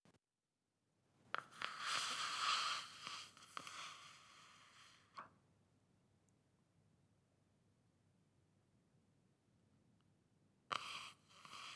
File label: Breathing sounds Αγωνιώδης Agonal